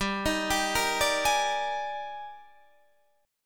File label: Eb Major 11th G, guitar